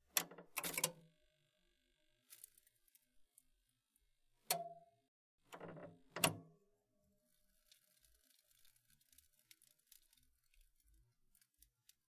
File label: Button Press Snap Cra, typing